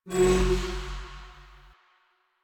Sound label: Choir Chorus Aura Power; Warframe